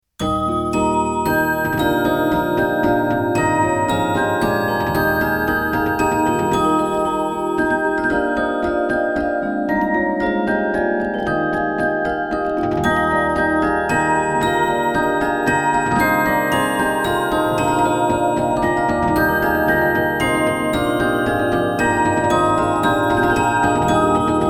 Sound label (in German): Spieldosen